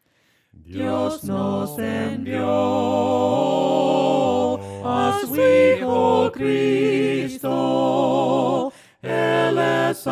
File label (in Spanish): VOCES, himnos a capella; cantos del camino